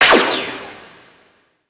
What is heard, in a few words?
cursor select; ExperimentalGear; titlescreen